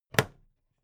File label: plastic clasp close heavy